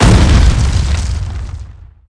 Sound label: bust concrete